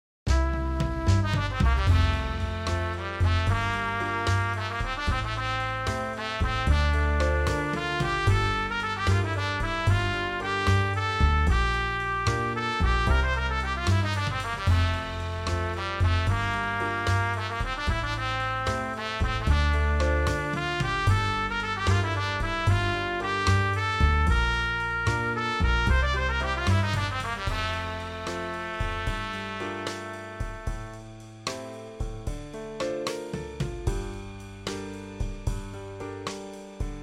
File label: Hold Musak Stylings